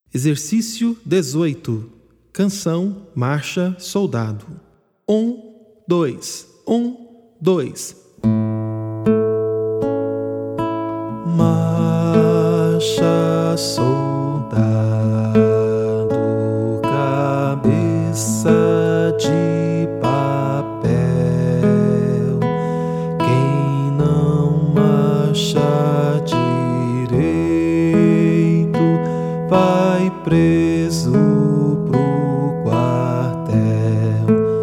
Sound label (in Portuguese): Marcha Soldado Voz Lento, violão